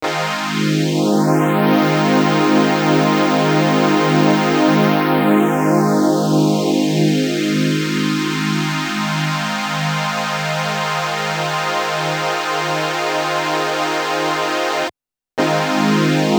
Waldorf Blofeld mideres open close not

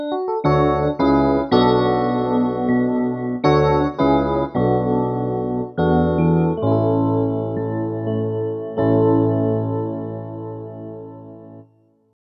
epiano fm, waldorf blofeld

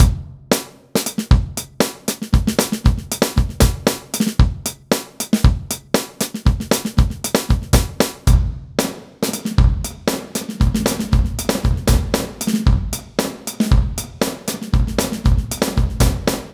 drum loop dry wet; u-he uhbik

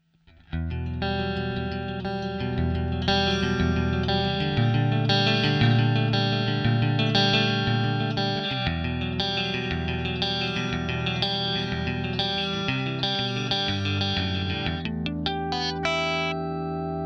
compressor

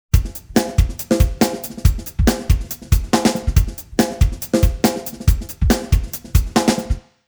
drums 75, timestretch; roni amazing sd type iv

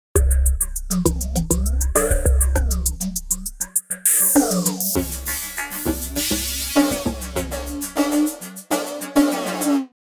phaser chorus; sugar bytes; effectrix

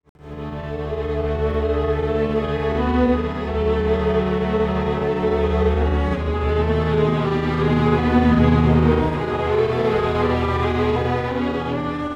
strings, timestretch; i3 dsp quattro x

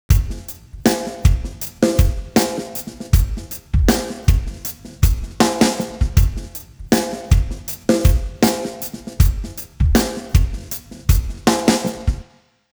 drums 133, timestretch; usb uvi workstation

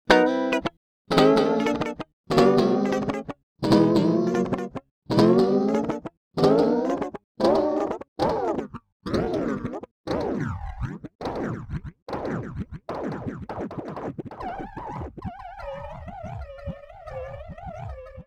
elektrik osc; u-he uhbik

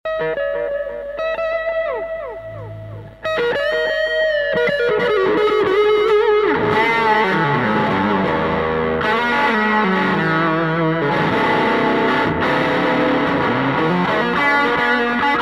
crunch tone crunch sg vox ac30; echopark; line6; tonecore

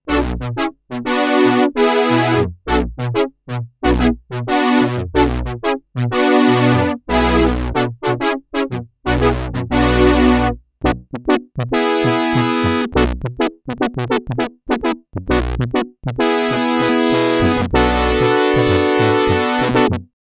brass; Prophet-VS; cuivres; arturia; origin

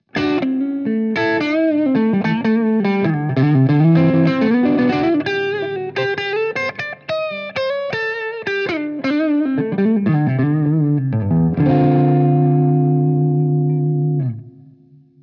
crunch neck gibson; vintage

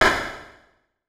small room, reverb; anderton

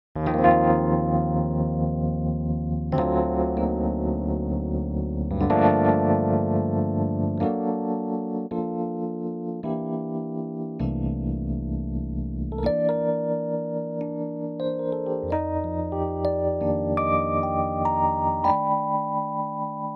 suitcase pan; u-he uhbik

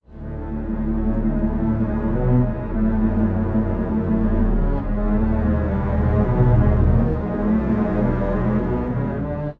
12, strings, pitchshift

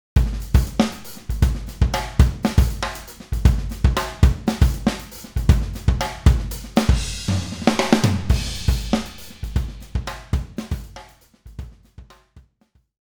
comp groove; toontrack

